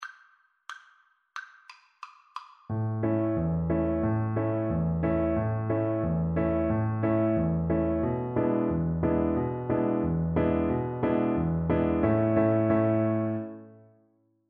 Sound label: rain, Violin